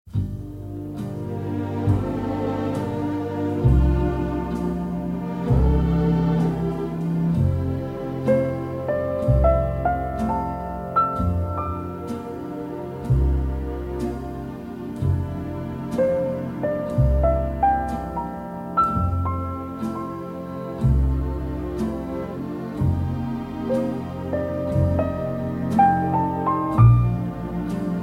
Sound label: Orchestra, Piano and Strings